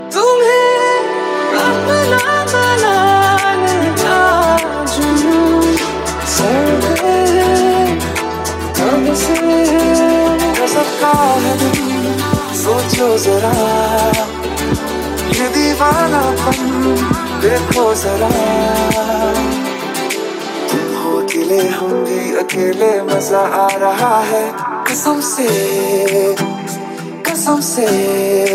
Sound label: romantic bollywood ringtone